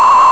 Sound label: PHOOH